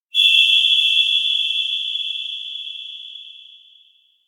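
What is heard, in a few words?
electronic grasswhistle play; chiharu; anemoi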